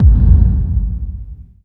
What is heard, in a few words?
VFX2 Sub